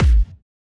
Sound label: kick a